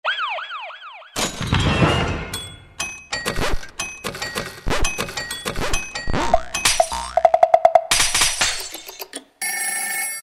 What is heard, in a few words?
F.X.; Latin And F.X. Percussions; Roland SN-U110-02